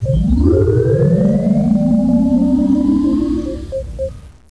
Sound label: turbo lift sound; Enterprise; startrek